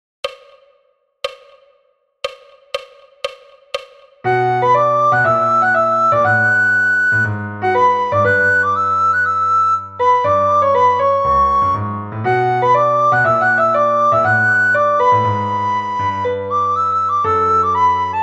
sopraan snel; Flierefluiter 7

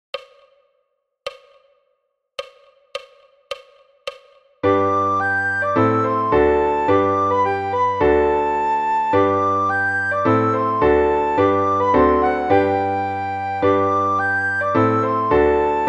sopraan; De smurfen; Flierefluiter 7

